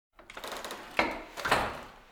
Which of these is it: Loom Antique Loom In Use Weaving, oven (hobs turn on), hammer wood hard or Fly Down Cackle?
Loom Antique Loom In Use Weaving